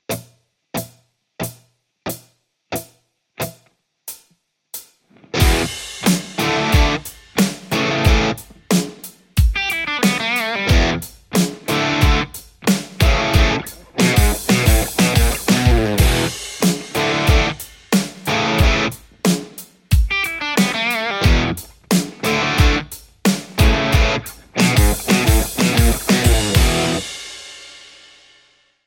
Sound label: tele lextac; poulin